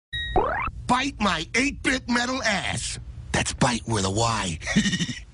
8-bit Metal

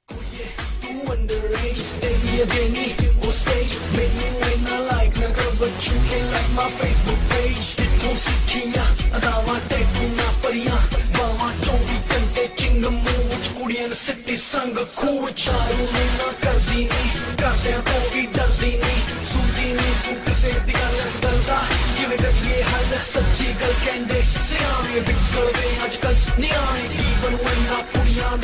Punjabi New Rap

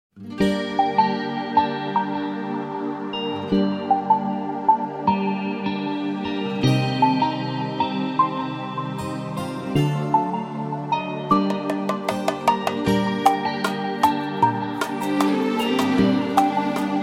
Slow Music

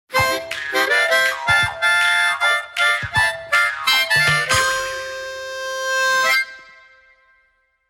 Harmonica Message

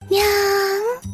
Nyaaaaaaan

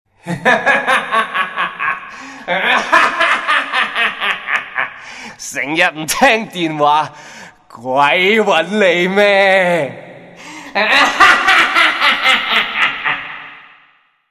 Ghost canto

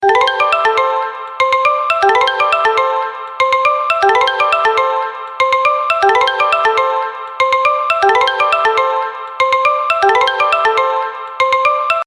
Marimba Ring

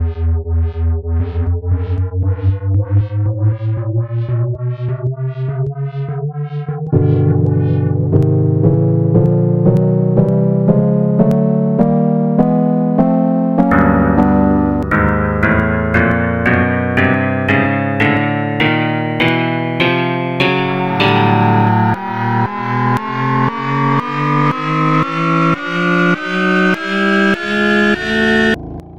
E flat chromatic

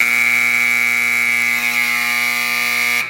Klaxon

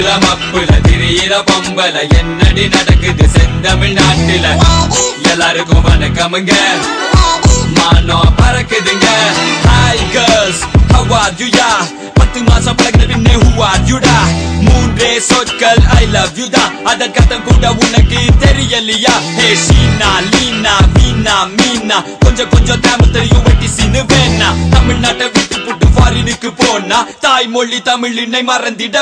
Hiphop

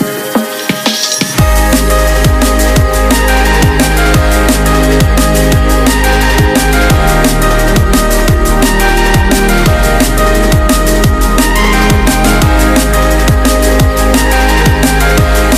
Remix

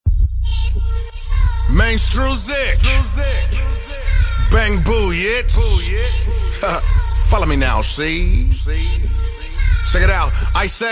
Bass

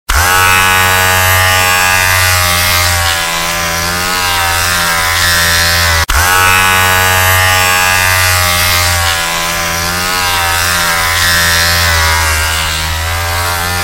Zzzzzzzzrrrrrrrrzzzz

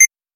Short Square Tone

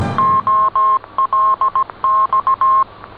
2 Beeps

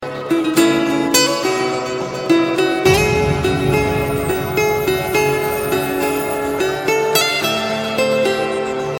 Guitar Sms

